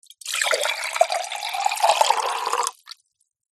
food drink still pour from jug into small glass; zapsplat

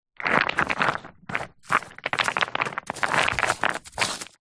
stones large movement few leaves; foley; zapsplat

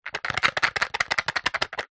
leisure game travel plastic box shake; cluedo; zapsplat

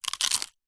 human eat bite crunch papadum

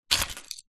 vehicles keys car pick up from surface

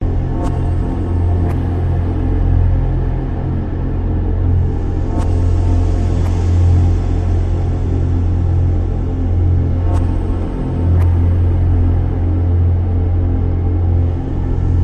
abnormal experimental multiverse interaction; Sound Ex Machina

